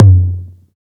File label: Tom Low 808; INFINITEARTH; 808Flex